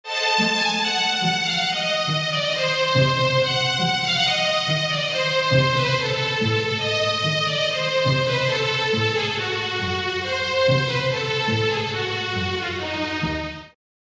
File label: Music Bed Old Movie, orchestral